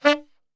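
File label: D saxophone shot, Brass Super Section; Best Service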